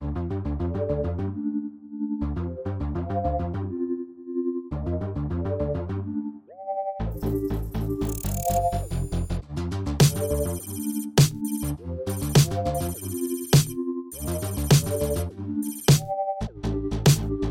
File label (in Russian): Джингл LOOP; Кликбез; Музыкальный луп "Кликбез"